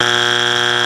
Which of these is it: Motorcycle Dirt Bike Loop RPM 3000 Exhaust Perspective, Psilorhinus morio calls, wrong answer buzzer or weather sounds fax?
wrong answer buzzer